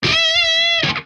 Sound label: rock concert, notifications; Google; Google Sounds; Play It Loud